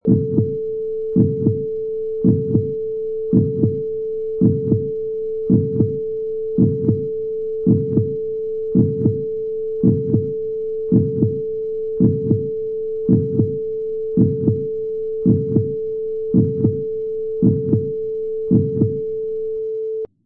Heartbeat

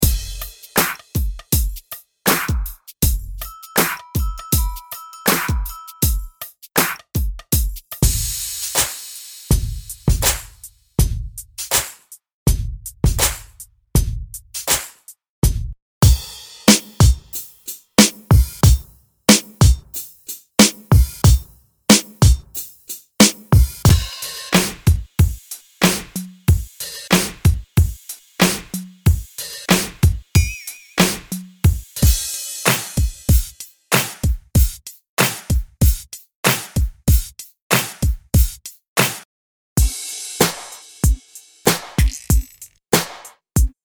LOOPS WEST

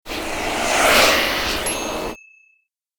Car Passing By Fast

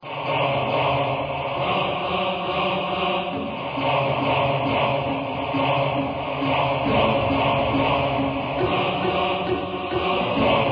Dark Choir and Strings

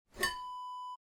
water scoop pick up

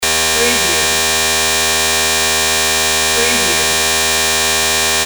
beep

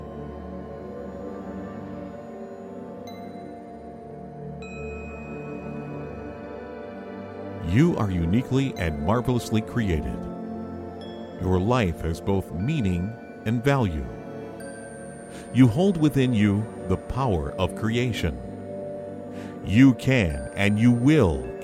meditation

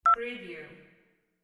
cell phone

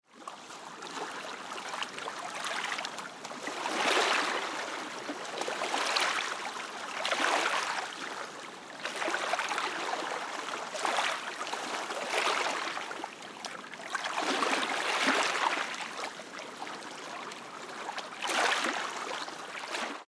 Shores of Lake Te Anau

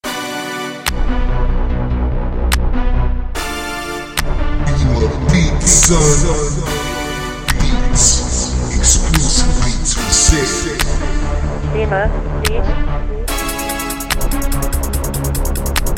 Trap Hip Hop

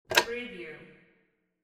phone pick up

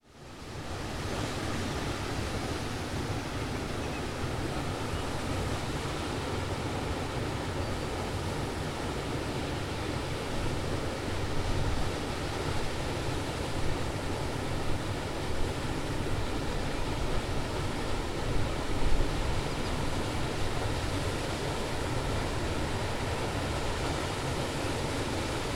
sluice

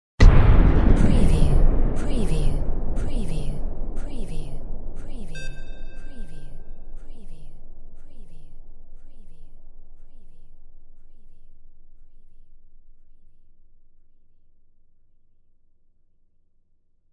WAR CANNON; windy beach audio

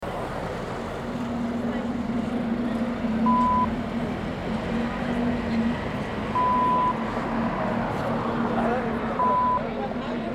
Night Bus New Ox St Siren; 3am

